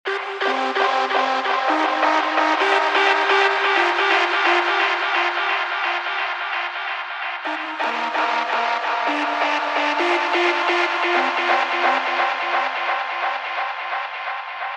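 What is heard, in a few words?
130 Loop Nights F minor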